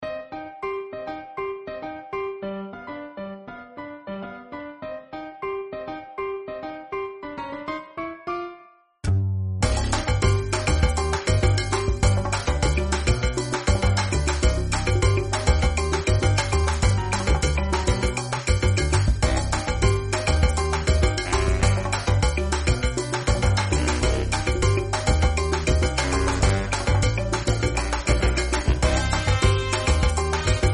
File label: theme loop